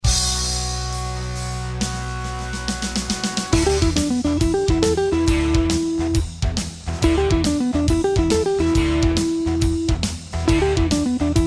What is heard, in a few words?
Asc Triplets; Penta Blues Patterns